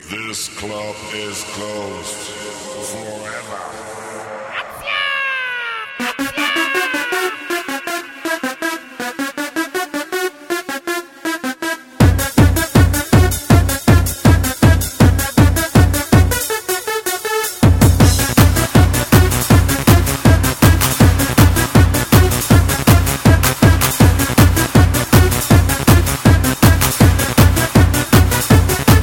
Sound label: Techno Remix